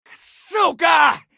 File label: scav wound; eft